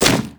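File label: Item Monster Tree; FlyForInsanity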